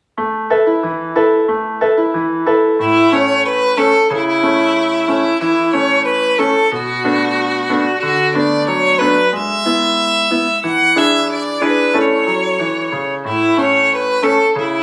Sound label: Jingles bells, violon